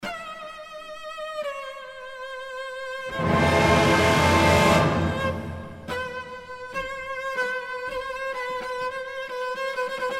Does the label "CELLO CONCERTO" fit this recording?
Yes